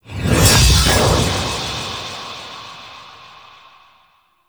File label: Sword Assembly Pulse; Gameplay; Warframe; Chimera Prologue